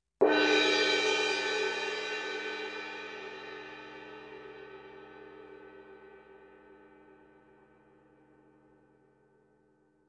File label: Sabian 18" AA Molto Symphonic Suspended Cymbal; Medium